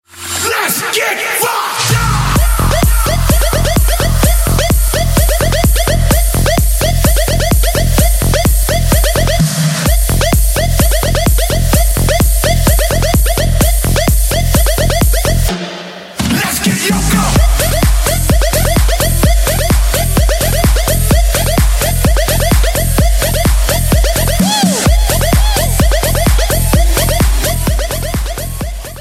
electro energy

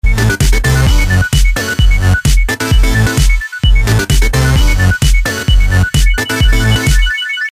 smash funk

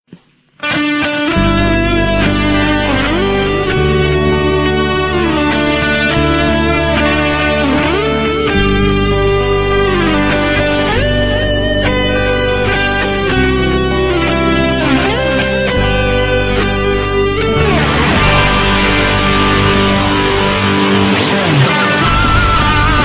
bday tone

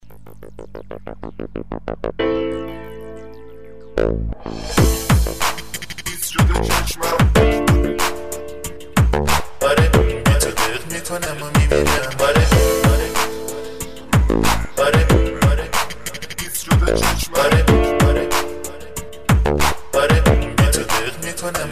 iranian music

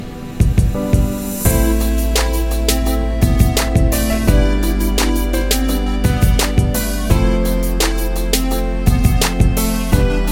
beat

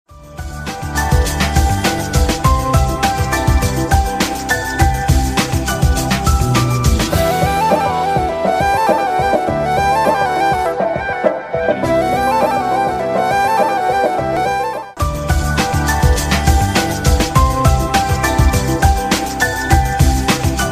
smooth nokia

